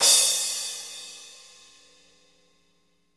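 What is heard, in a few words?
cymbal crash; Best Service Gigapack 2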